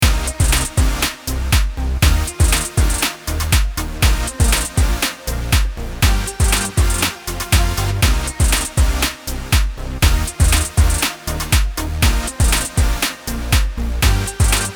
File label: loop dramatic